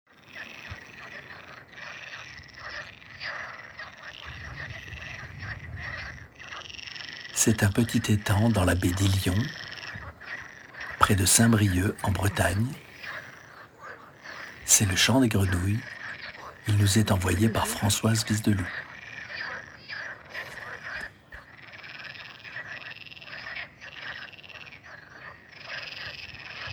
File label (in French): grenouilles